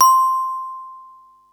mallets

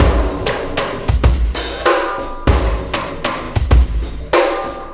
Sky Lab Beat, Processed Drumkits

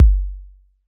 kick machine

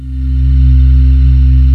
SY CLEAN PAD, FM AND SY

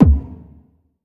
bass drum, Drum & Bass, 160 BPM; Ueberschall; Drum & Bass Resonance

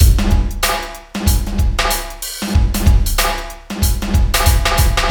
DREAD LOOP

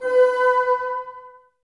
BNS B 4, Bassoons, Wood Winds; Miroslav Vitous - Woodwind Brass Ensemble; Bassoons Links

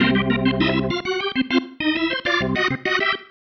Pattern, Organ

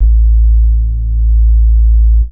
sub A, techno trance bass

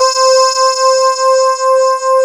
C5, Choir Acoustic & Synth; USB Soundscan vol.28 - Choir Acoustic & Synth; AKAI

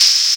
hats, drum machines, FutureRetro FR777

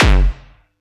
Yamaha EX-5

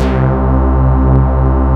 SOLID M SYN, SYNTHS; Pro-Rec - Synthsonic

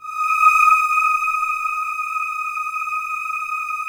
Matrix 12 choir, vintage keyboards; Big Fish Audio - First Call Vintage Keyboards

